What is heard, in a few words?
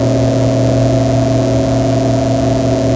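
computer spatial ambience loop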